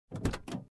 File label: Locked Car Door Handle